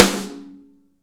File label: SN, Real Mega Drums; Best Service; Real Mega Drums VOL-1; SN AMBIENTEC